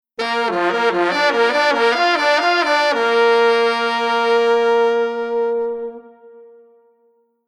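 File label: Horn Unison Trombone Alto Sax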